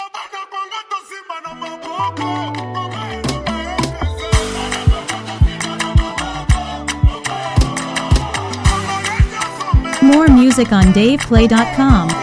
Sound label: Congo Makossa vibe